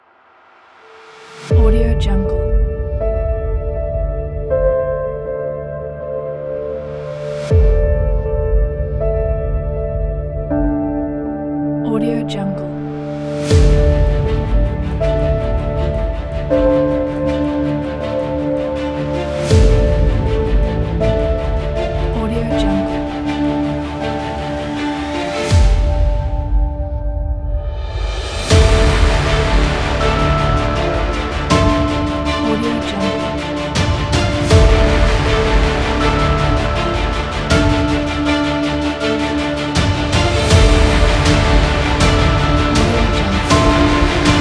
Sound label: a trailer teaser